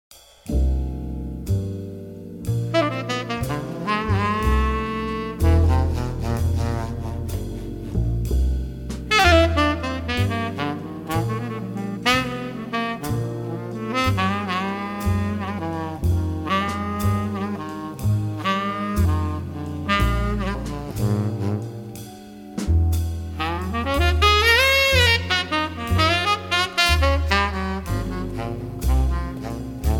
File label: Idle Blues; Adult Themes